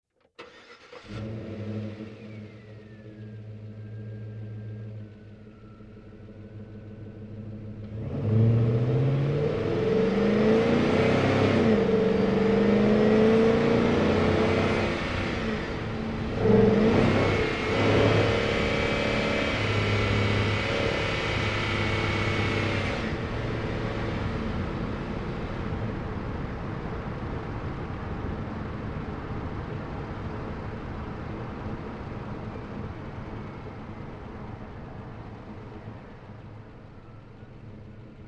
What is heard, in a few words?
Truck GMC Savana Onboard Drive Accel Decel Fast; Trucks; Big Room Sound